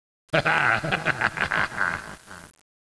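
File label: ambient, halloween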